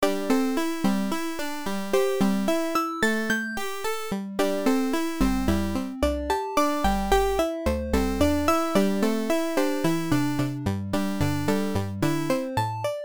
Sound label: chiptune; the town where i got the magic bottle